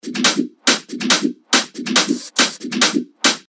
140 BPM, techno loops; revealed techno top loops vol 7; Pssst

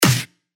Snare; Honey; revealed claps snares vol 2

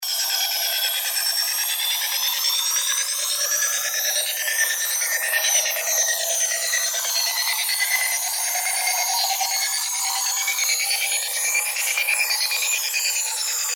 NoiseFX Loop 140 BPM; Elixer; revealed fx loops vol 2

